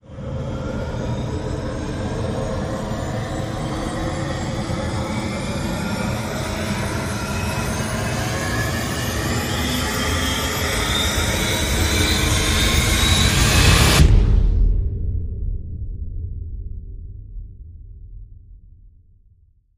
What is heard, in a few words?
Rise Long Velocity